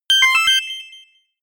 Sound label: notification pager; kommunikation; signale